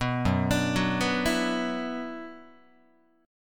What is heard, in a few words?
Gb 7th Suspended 4th Sharp 5th Gb Guitar; arpeggiated; JGuitar